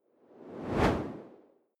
whoosh simple

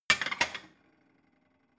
plate shelve slide

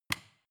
switch small turn on hard

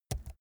keyboard laptop key press slow soft

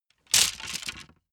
wood sticks small break

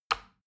plastic button tiny push regular

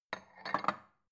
bowl shelve soft

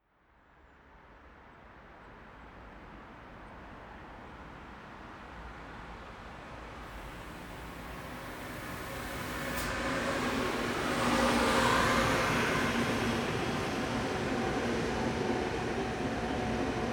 train high speed train passing slow

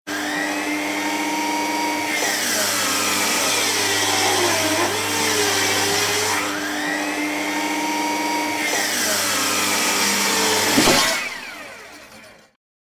electric fret saw

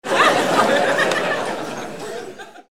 laugh

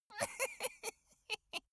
dwarf laugh sneaky

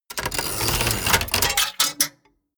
mechanics small rattle

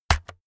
keyboard external key hit fast

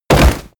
bodydrop leather

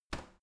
garbage container light lid shut soft

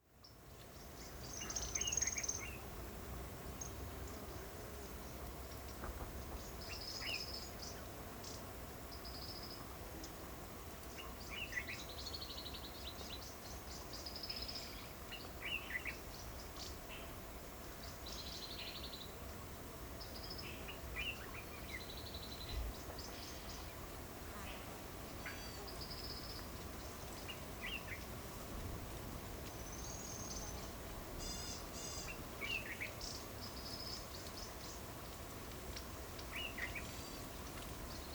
african bush midday birds insects

